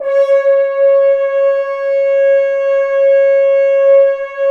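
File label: BRS, French Horns Dry f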